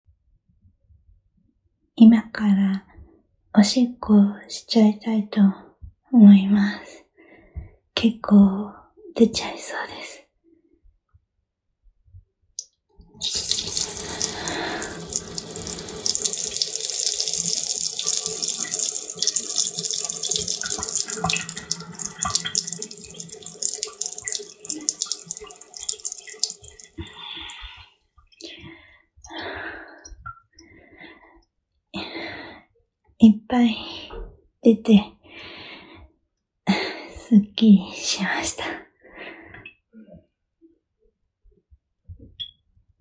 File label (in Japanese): おしっこのみ音声